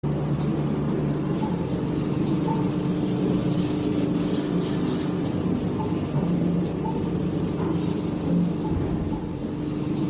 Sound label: elevator; Elevador